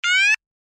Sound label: alarm tone, sounder alarm tone; sounder beacon